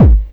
KICK -R, Disco House; USB Soundscan vol.20 - Fresh Disco House I

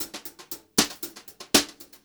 HOTROD VARI, Brushed Drum Loops; USB Soundscan vol.39; AKAI

